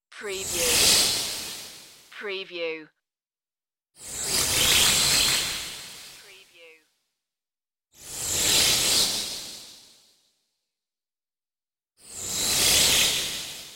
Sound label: magic spell swooshes; Magic / Spells / Fantasy